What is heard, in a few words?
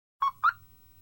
Car Alarm Chirp